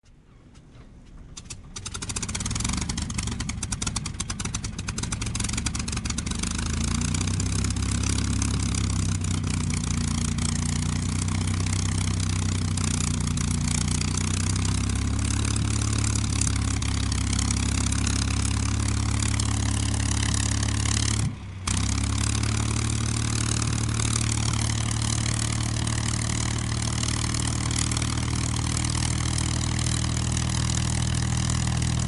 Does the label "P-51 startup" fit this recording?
Yes